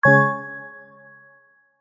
multimedia notification digital bell warm attention